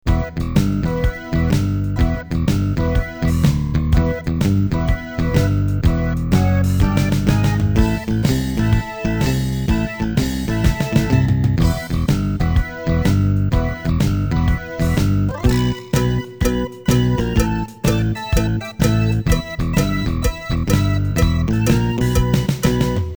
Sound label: Loop